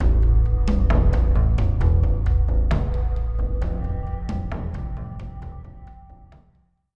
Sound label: sounds music; Halo Combat Evolved; Xbox